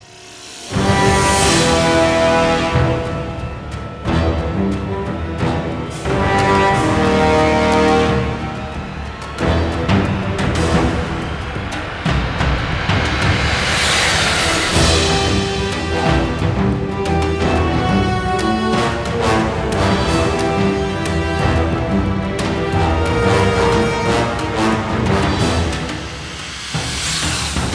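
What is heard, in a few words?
Battle E minor 180 bpm; Disney Infinity 2.0 Marvel Super Heroes; Arachna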